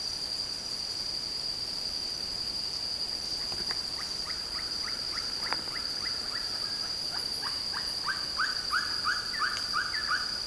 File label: Ferruginous Pygmy Owl Glaucidium brasilianum brasilianum; Arizona